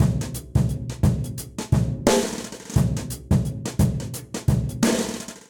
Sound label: Fill BrushSizzle 87 bpm, Session Drums; Session Drums Club; Ableton; Factory Packs